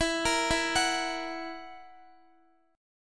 E Suspended 2nd Flat 5th E down Guitar; Esus2b5; arpeggiated

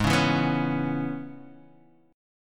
C sharp Minor 7th G sharp

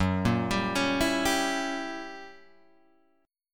Gb 7th Flat 9th Gb Guitar Open G

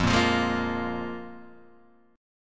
F Minor Double Flat 5th, Guitar, DADGAD

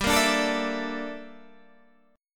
G Minor 6th Add 9th G down Guitar Standard; Gm6add9; strummed; JGuitar

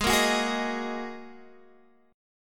G sharp 7th Suspended 2nd, Guitar, Standard; strummed; chord; 16,13,16,13,16,x

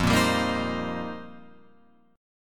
F 7th Suspended 2nd Suspended 4th F Guitar Open F; F7sus2sus4; strummed

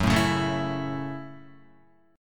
Gb Minor 7th, Guitar; strummed; JGuitar

